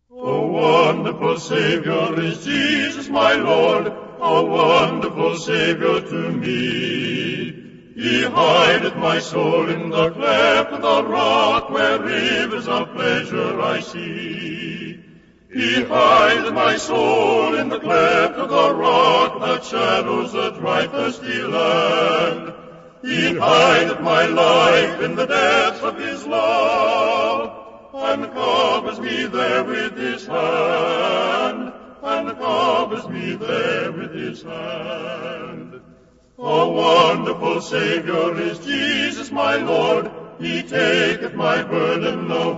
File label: a capella hymn; a wonderful savior